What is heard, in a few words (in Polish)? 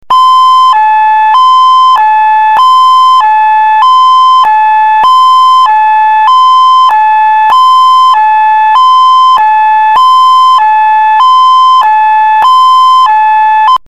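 sygnał pies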